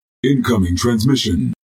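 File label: incoming transmission ringtone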